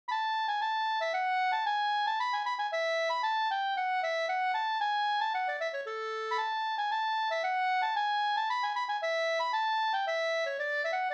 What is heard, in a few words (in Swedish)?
polska springdans; fr Hedemarken